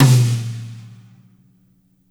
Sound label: Tom Dry Funk Brush; Drums